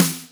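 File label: fat snare, Boss DR660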